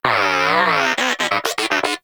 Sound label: Moa Inquisitive Vocal Studious; Pets; Warframe